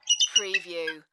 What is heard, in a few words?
American Bald Eagle squawk staccato